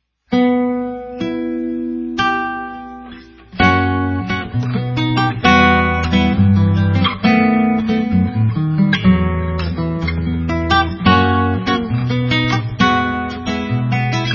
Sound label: Choros Typico, sologuitar